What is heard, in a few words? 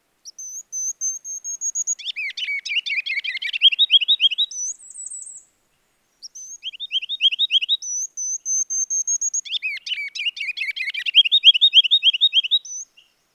Ruby crowned Kinglet Regulus calendula calendula